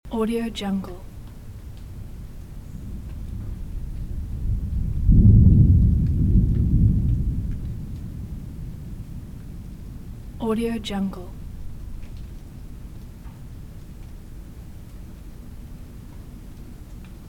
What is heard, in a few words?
storm coming in on countryside ambience loop